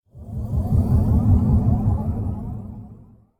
protoss building select; starcraft ii 2010

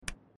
opening ingame menu sound; Life is Strange 2; gamesoundopedia

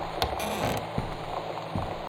action

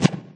brick move; candy crush saga online

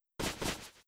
cavestory shoot

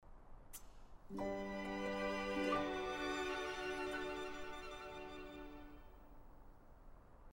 mood flirty; sims 4

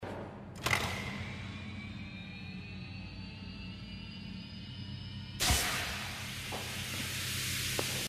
box; inside

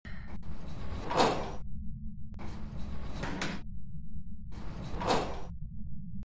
prison door; phasmophobia

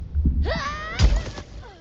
die falling; kena bridge of spirits

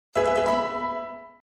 level up; pokemon

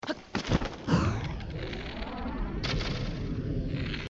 bow slow motion; Zelda - Breath of the Wild

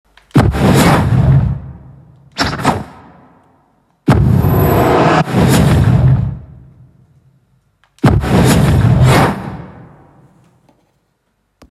attacks; brawlhalla

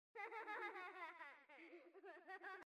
children laugh; baldursgate

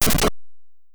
player punches; 8 eyes

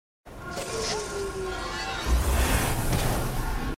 phase in; risk of rain 2

